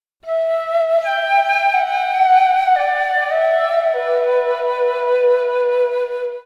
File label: Flute; Lugia